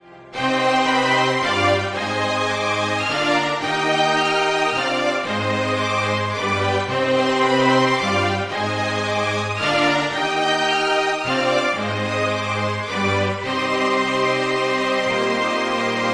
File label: Choir, Strings, Flute; Chrono Trigger; Magnus Choir; Banjodoline; Syntheway